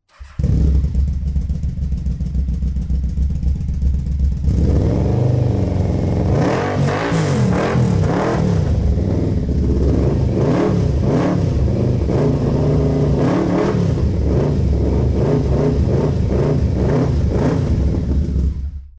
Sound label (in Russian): yamaha moto peregazovka